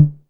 808 MID, TOMS; Back In Time Records; Big Bang

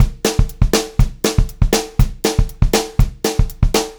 BEAT, Pop-Rock Drumloops; USB Soundscan vol.37; AKAI; 120 SPEED

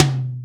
R8 Tom, Roland R-8

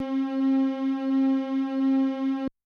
STRNG; Yamaha DTXpress-II

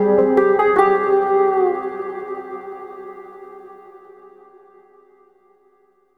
Riff, Synth Sequences

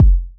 Kick, Kickdrum; Equipped Music; Loungin House

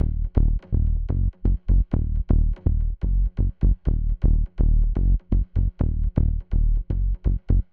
124BPM EASY BASS D#, Bass Loops

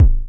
BOSS PERC SNTH, DRUMS PERC; Rubber Chicken Software Sample Bank

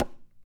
CHARANGO FX; Guitars; Spectrasonics; Hans Zimmer Guitars VOL-2

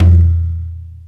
INDIAN, DrumBase; Crypton - DrumBase5000

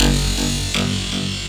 Stepper Stretch; M8

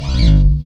Bass Chapman Stick; Roland LCDP02 Guitar and Bass

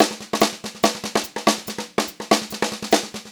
144 SPEED, Pop-Rock Drumloops

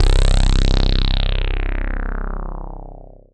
Dance Floor Bass, Multisample Basses

